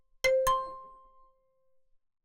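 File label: BALAFON - NOTIFICATION OCTAVE UP; Ui; Scoba Sounds; Scoba Ui Essentials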